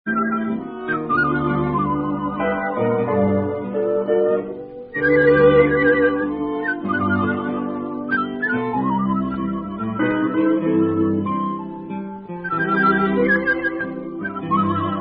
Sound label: Shady Valley Waltz, hillbilly; 1930s